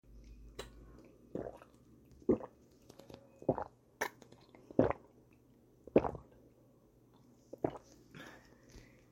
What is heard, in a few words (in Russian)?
mat pet vodu v barra du turvo